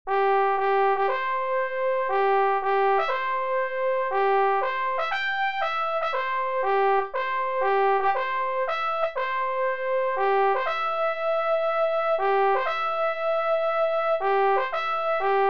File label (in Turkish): ti sesi